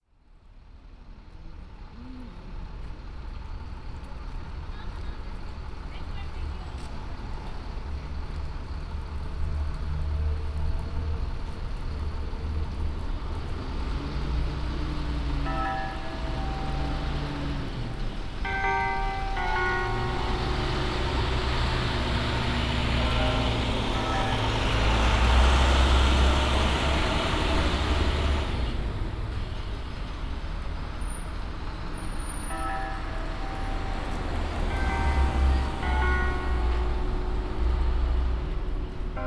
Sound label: Library Bus Stop